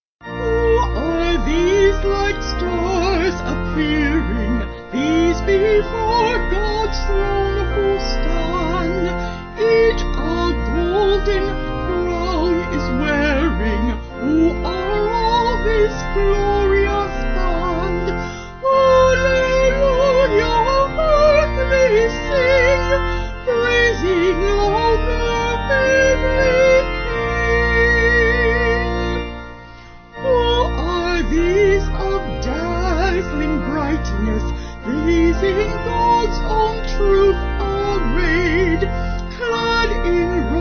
Vocal Organ

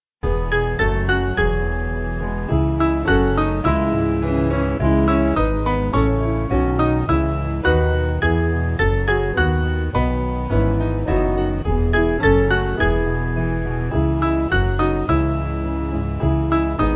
Piano

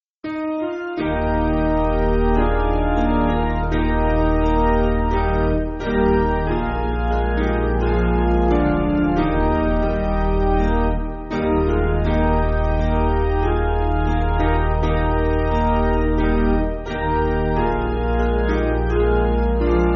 He Looked For A City Piano; Bishop

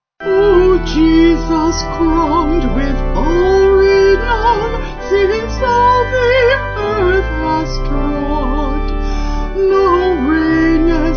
O Jesus Crowned Kingsfold Vocals Organ

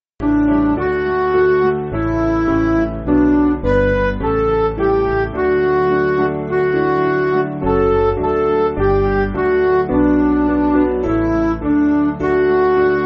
Midi

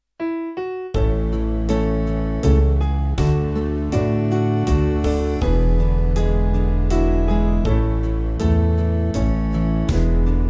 Alleluia Alleluia Slow

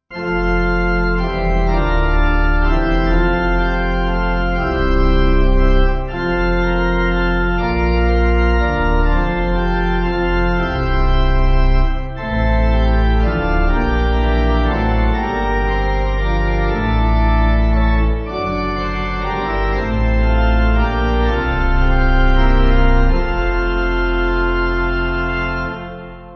May The Grace, Pipe; Petersen

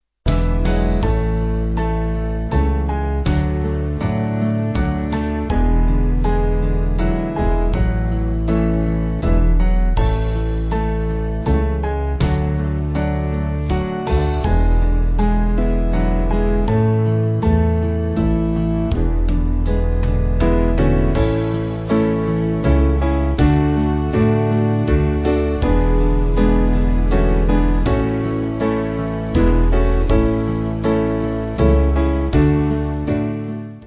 Slow Band

